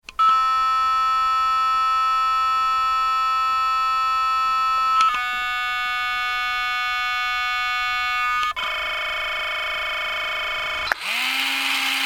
Small Electric Motors; Tom Hutchings

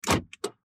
Pontiac Grand Prix Door Power Lock Interior; Transportation Automobile; Car

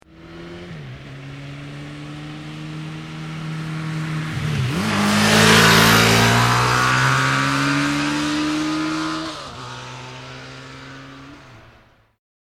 Motorbike Suzuki 750 Up and Past Fast; Motorcycle; Tom Hutchings

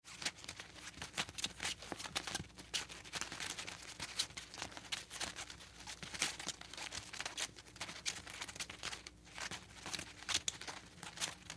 Flag Flapping Small Ribbon, wind; Weather

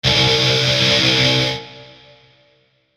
Ascend Synth Static Musical Swell Pad; Blastwave

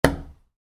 Wood, Light Impact: Brick; Wood Sound Effects; Wood (Sound Effects:Materials); Stuart Duffield

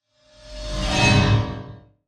Whoosh Metallic, Feedback, Accent, Transition; Production, Element, Imaging; BlastWave

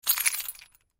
Keys Jingle, Keys Jingle Shake; Jangling Sound Effects; Foley; Objects; Blastwave

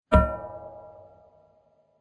Clang; Clang Sound Effects; Impact

